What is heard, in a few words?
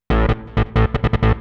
legend, 160bpm, bass, hous-tec